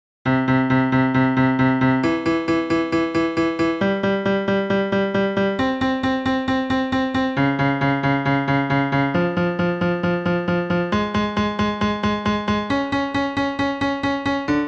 ネイ音源 ピアノ 男性中音

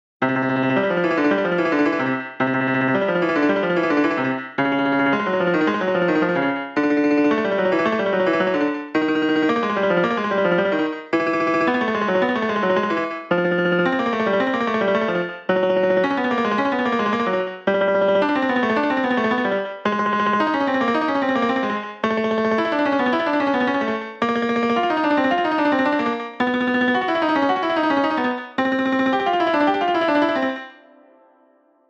タングトリル用 ピアノ 男性中音

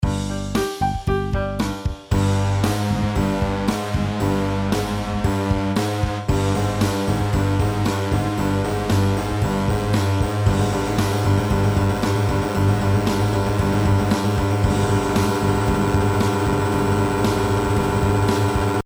ビブラートトレーニング用・男性低音

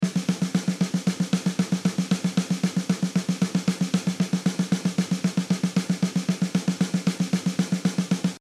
トレーニング用・16ビート

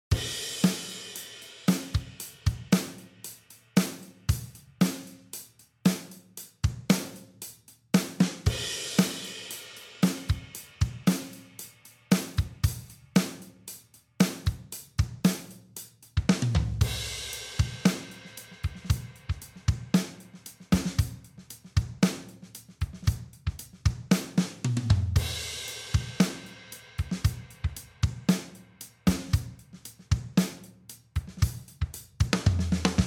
トレーニング用 ビート

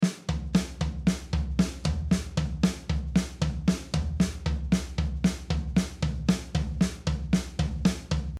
トレーニング用・裏拍感

